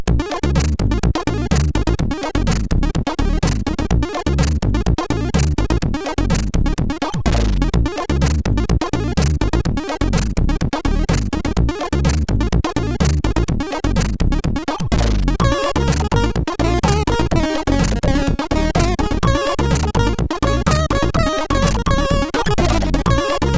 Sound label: Snazzy Hip-Jazz, MOS6581R4